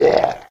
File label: zombie hit; wesnoth